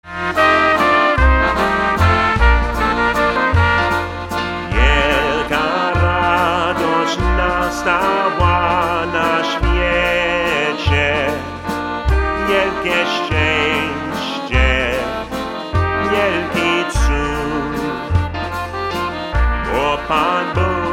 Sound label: Polish Pride Waltz